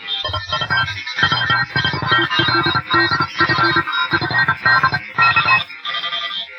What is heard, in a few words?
Shortwave; ambient realms